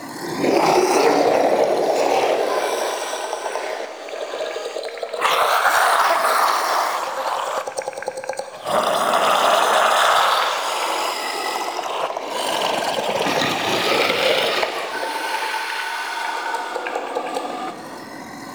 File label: Mockery Male Vocal Ghost Loop; Characters; Enemy; Vocals; Mockeries